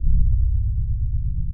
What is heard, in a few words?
Piano Note (Fast) Bass; Ableton; Factory Packs; Samplification